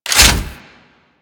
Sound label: Flame Thrower Reload A; Sargas Ruk Flame Thrower